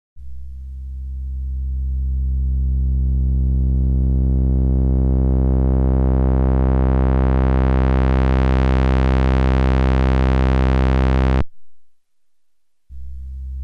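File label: CHARACTER SWEEP CRISP, synthesizers; Redshift 6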